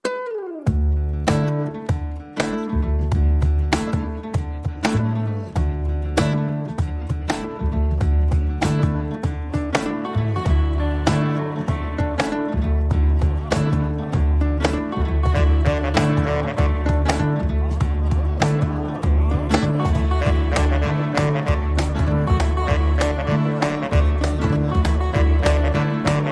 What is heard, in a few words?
Megaphone Version